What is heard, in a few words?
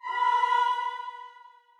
choir